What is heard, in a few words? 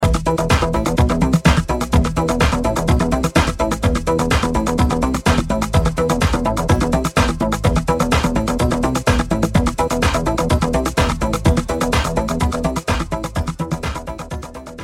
TESTSOUND Triangle